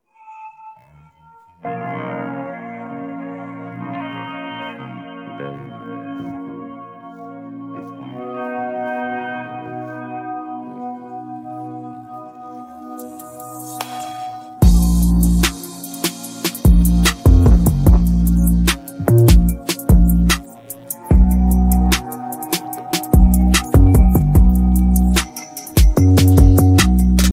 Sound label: instrumental; persian